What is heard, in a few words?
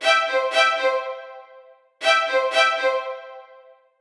Violin Stacc; струнные